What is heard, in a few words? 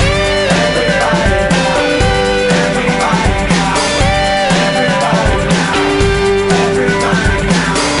Blues Rock; Happy Feet Two; NDS